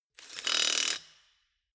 impact wrench screw fast single

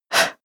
female breath fast out

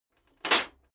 metal plate armor movement single

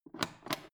foot switch turn on off

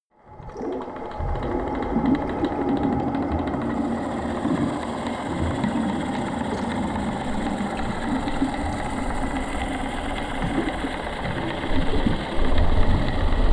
water fx

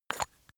glass bottle open

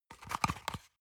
paper box small handle hard

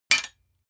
sword metal hit rattle

